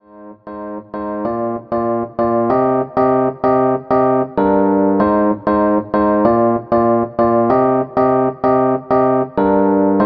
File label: Rhodes chord, hip hop chords; rhodes chord 14